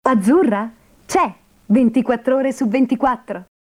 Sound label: jingle